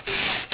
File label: slide